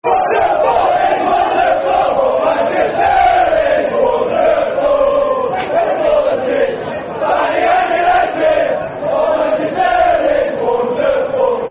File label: Football Chant (Soccer Song), Man United; Manchester Is Wonderful